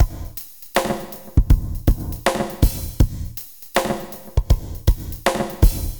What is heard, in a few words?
Effect Loop, BPM 80